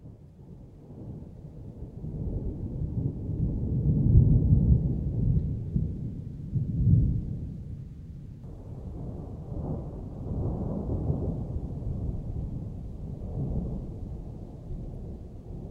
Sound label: thunder